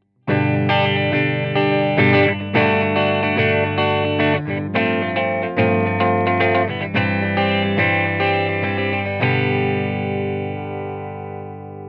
clean el34 bright; brunetti pirata141

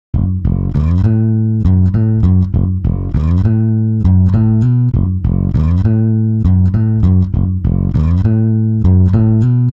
stretch, bass; ik sampletank

